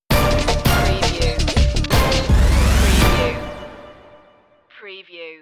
company ident horse power

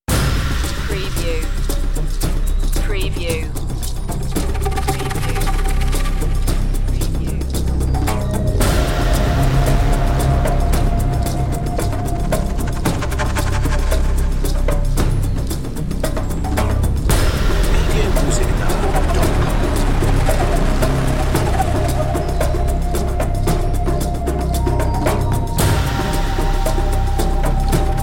underworld series dramatic cue

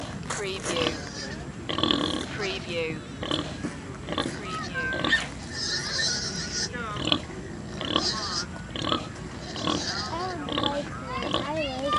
Sow Nursing; Farm ambience with sounds of pigs squealing, oinking and pig snorting sound effects.

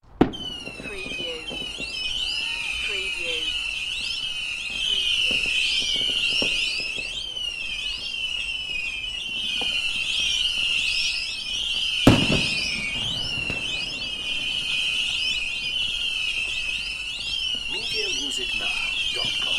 Screaming Fireworks; beanstalk audio

